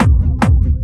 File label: Medium, kicks